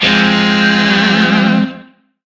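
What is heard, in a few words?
Shawzin Minor Chord; Warframe; Lizzie Shawzin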